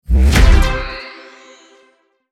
buy; JDM Racing

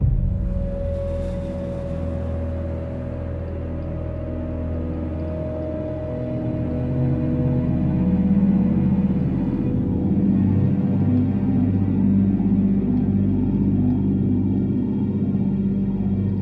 loop Tense

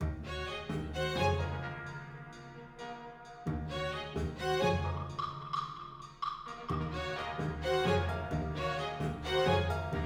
Field Battle Cave

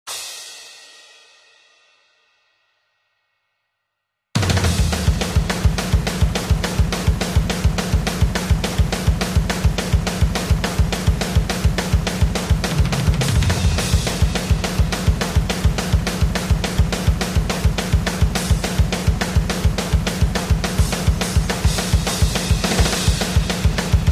Drums & Sfx; minions 3a; neverdead; ps3

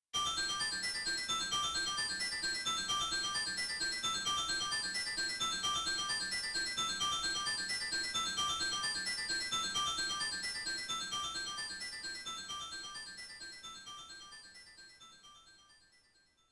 Goal Game; Kirby Squeak Squad; Sound Treasure Collection

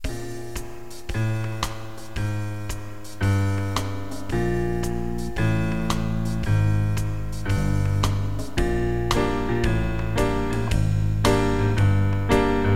Jazz; metalheart replicants rampage

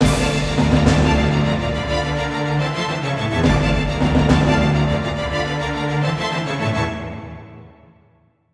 Battle Start; quivr; ps4 windows gamerip 2016

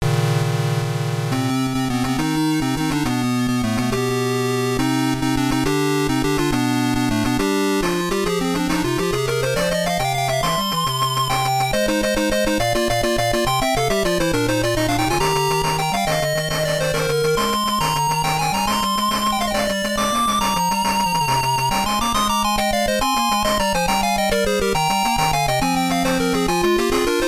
Defeat; Head Buster Remastered Soundtrack

